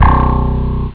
bass cool; ST-XX Amiga Soundtracker Sample Packs

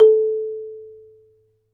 MARIMBA; ILIO

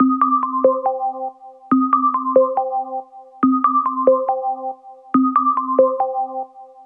140BPM C; Vengeance - Essential Clubsounds Vol.4

